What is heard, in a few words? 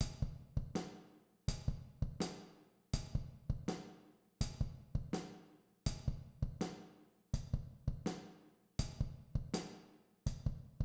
Rock beat